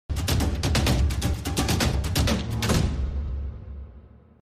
scroll; casino; corgipharaoh

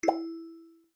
plinko; casino

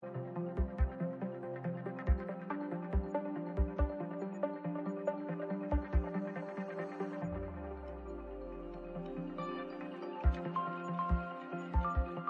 music loop; casino

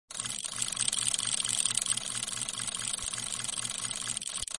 bonus game scroll; casino; corgipharaoh

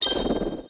Roll Fast; casino; corgiwild

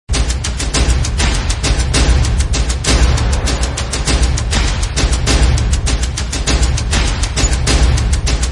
bonus game background; casino; corgipharaoh

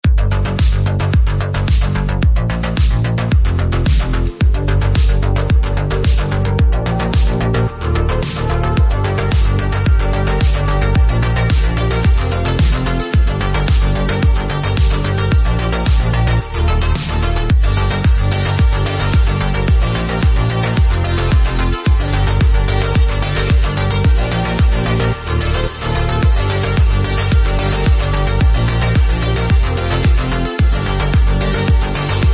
spacedice background; casino

